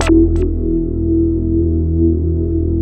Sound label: DANCE SYN, Synths & Keys, Techno Trance; E-MU Formula 4000 Series Vol. 2